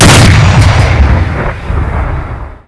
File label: weapon fire tank; weapons; Spearhead Demo; MacFormat 2003 June